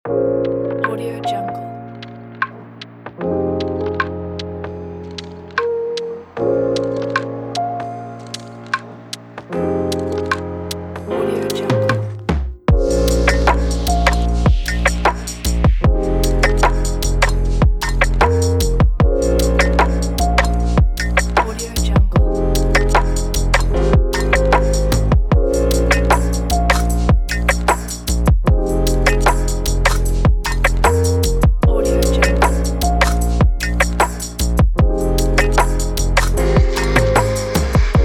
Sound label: fashion lofi hiphop; penguinmusic